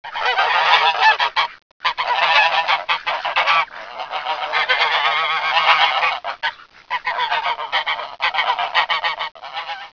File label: GANDER, 鹅; 动物; 家禽; 特酷声音素材库